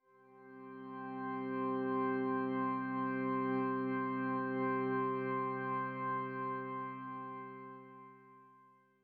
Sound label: Church Organ; Grindstone